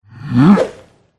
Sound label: chaos titan attack voice up